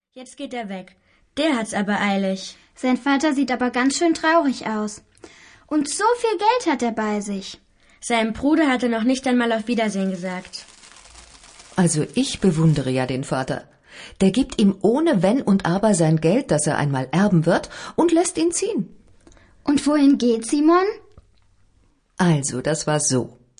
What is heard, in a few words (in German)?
Zwischenmusik